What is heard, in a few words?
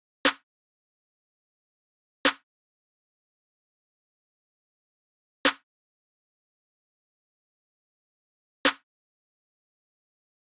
Snare